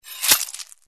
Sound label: Sword Stab